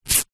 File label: household band aid plaster strip rip tear